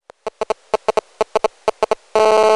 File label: gsm network